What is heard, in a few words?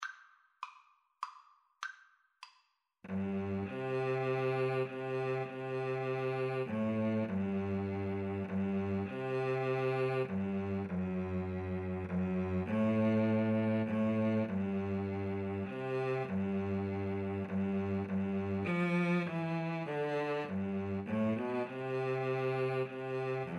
crimond, Oboe Cello Duet